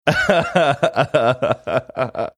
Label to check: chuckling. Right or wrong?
Right